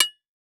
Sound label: Impact Tire Rubber Hit By Solid Metal Pole